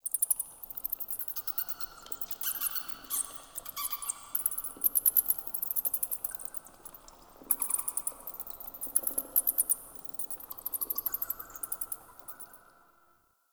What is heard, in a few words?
Dungeon Island Cave Bats, Ambience; Warframe; Duviri